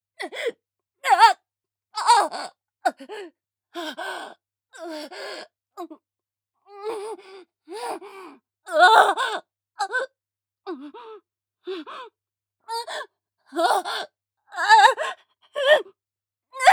正常位・感情高ぶり喘ぎ・ゆっくり; ヘレナ

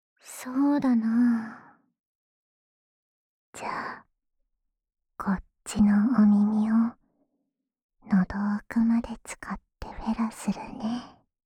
ダウナー 喉奥右耳フェラ, ASMR; フェラ素材

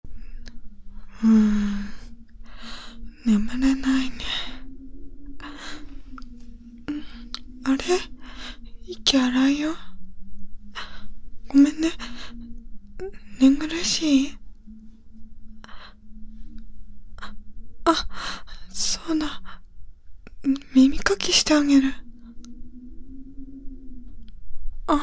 お口で耳かきしてあげる, ASMR

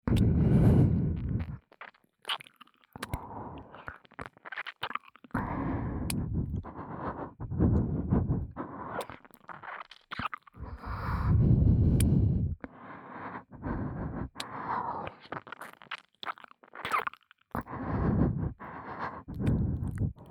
(左)吐息たっぷりまったり耳舐めエッチ, KU100 パンパン系効果音版; 舐め音比べ

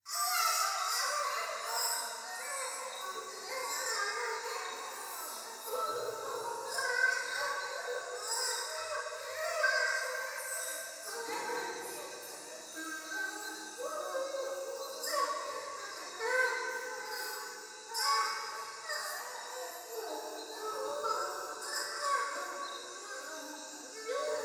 トイレ シャブ喘ぎ 山奥夜

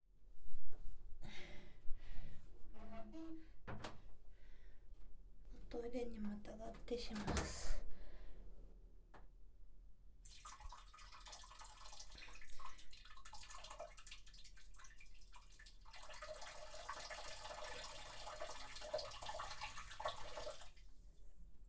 トイレで 便器にまたがって オシッコですッ

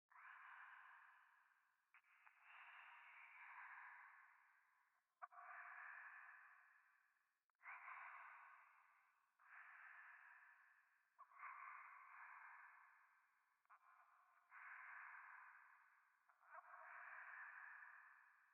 ゴースト 左耳密着処女喘ぎ

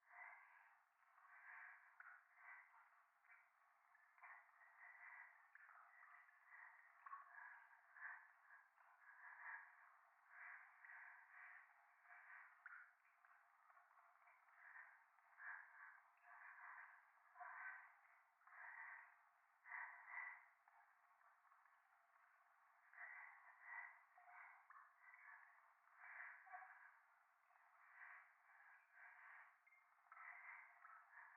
夢の中 右耳快感吐息 雨上がり

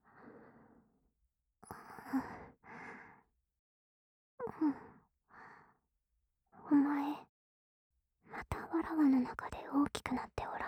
スライムオナホと耳舐めで射精するのだ; 効果音あり

